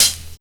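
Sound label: drums, Pure Electro; USB Soundscan vol.03; AKAI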